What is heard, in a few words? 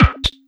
Beats, Synchro Funk